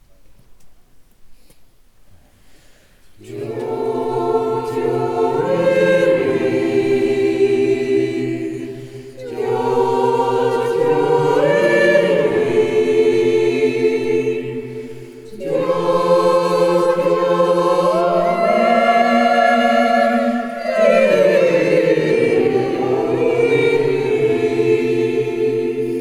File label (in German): Andachtsjodler